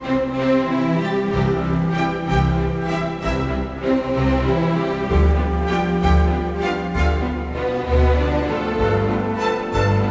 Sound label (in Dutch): Klassiek Wals; Kermis Jingles Diversen